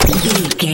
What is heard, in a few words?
sci-fi weapon reload